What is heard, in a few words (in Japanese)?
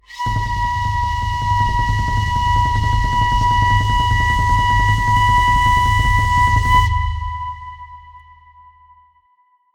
ヒュードロドロ